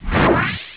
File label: edge hit